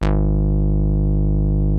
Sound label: TAURUS A2, synthesizer; Hollywood Edge - Giorgio Moroder Rare Synthesizer Collection